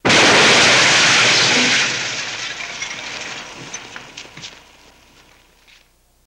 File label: Glass Ceramic Tile C